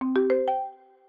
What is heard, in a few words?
sfx anim guide